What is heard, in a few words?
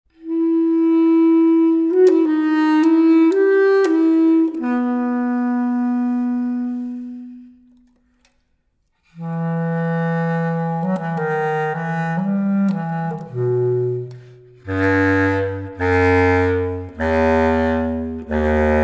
Trio (Bass clarinet solo); Les Huguenots